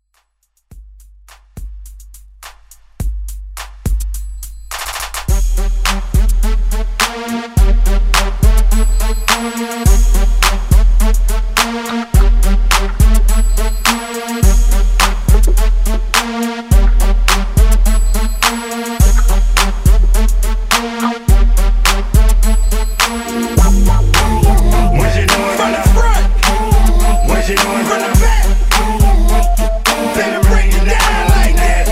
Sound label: Throwback Redrum